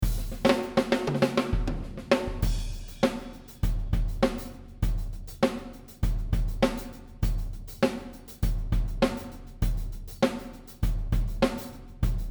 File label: Superior Drummer 3 Ludwig Classic Kit